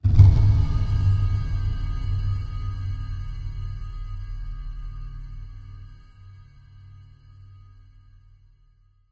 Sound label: impact cinematic muted bass with distant bright attacks ominous chord